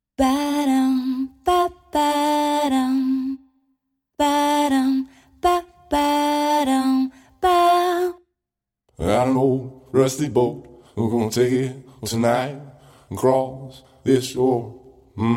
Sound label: Vocal PA Fabric